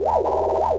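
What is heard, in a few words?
sith probe droid steady loop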